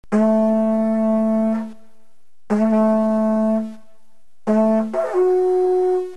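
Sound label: trumpet, didgeridoo; Rhythmic Playing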